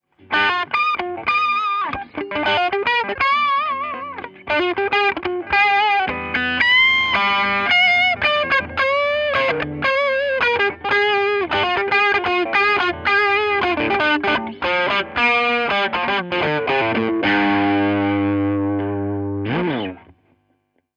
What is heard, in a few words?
stratocaster rosewood crunch